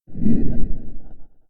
Rock Boulder Respawn

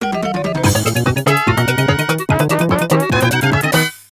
Lose; lego racers

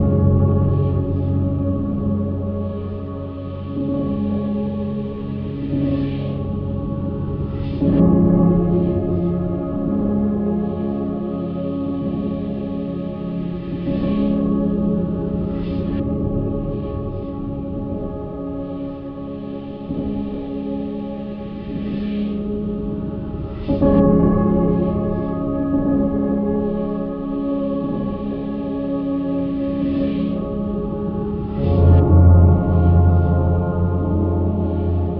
Piano House; minecraft story mode gamerip 2016; The Last Place You Look

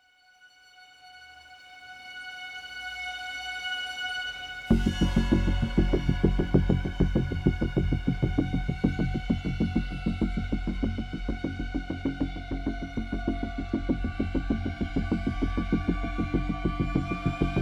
Suspense, General Battle, Oneshot

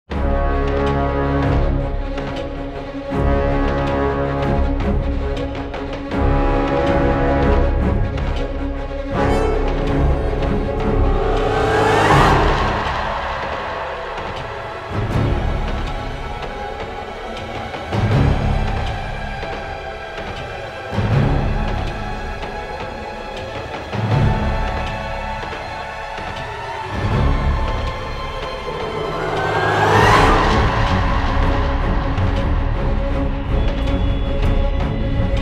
Chase Loop; inkwater Marsh Nightcrawler

